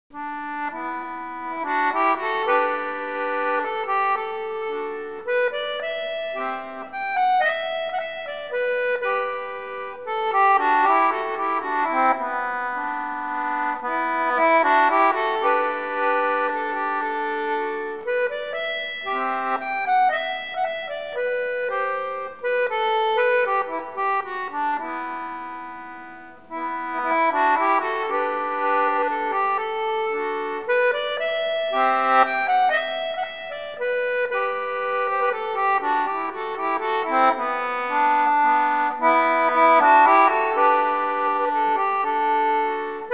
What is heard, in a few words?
concertina; Floating from Skerry; English concertina